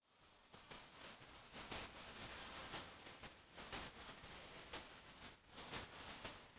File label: wav vs mp3 null test